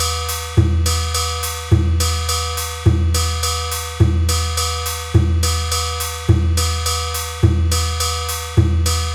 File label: Asian Percussion 105 BPM